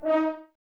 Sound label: Brass F.Hrns D#; Miroslav Vitous - Woodwind Brass Ensemble